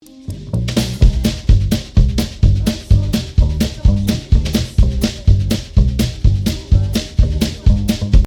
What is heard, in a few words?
kick snare all, batterie; les Over-Head plus une reverbe avec algorithme "AMBIANT" de chez Lexicon